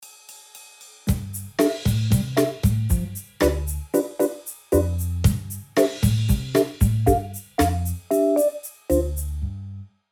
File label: Classic ad break transition sitcom music; gfxsounds